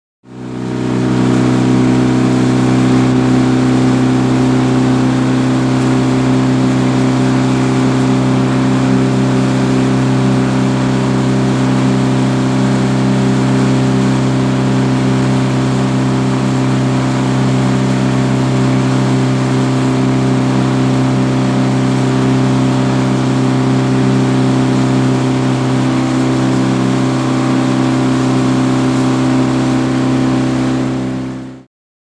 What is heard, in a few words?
Boat with Twin Engine; Run ( Int. ); Twin Engine Motor Boat. Interior.; powerboat